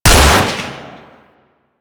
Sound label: Magnum Shot 2D, Weapons; Warframe; Tenno